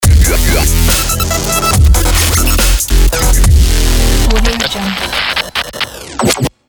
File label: dubstep glitch logo